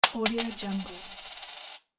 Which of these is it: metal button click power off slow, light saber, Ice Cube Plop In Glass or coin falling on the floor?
coin falling on the floor